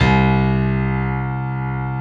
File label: PNO, Piano